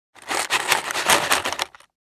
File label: Plastic container or box shaking objects inside; gfxsounds